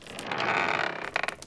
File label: wood lite creak; blueorange